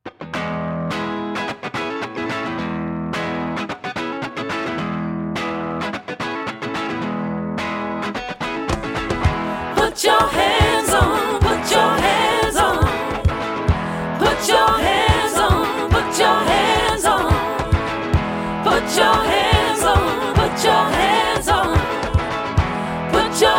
voices

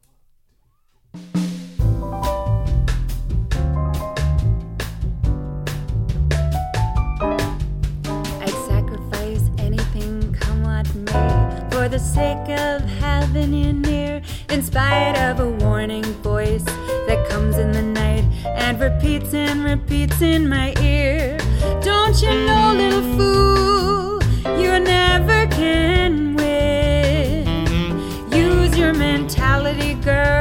jazz

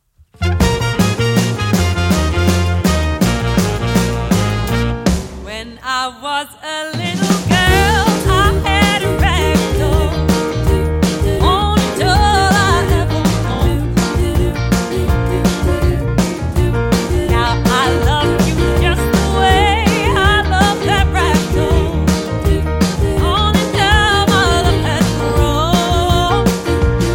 motown

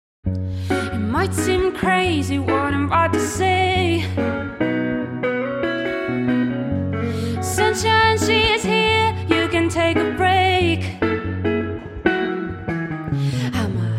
acoustic happy

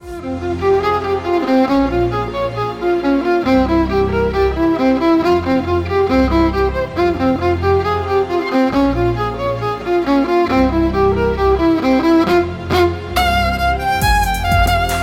violinist; classical musicians

